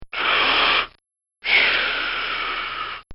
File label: Cartoon Sound Effects Slurp; Cartoon Sound Design